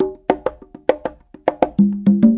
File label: 090 bongos, 090 bpm; Bazillions of Bongos